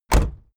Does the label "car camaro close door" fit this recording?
Yes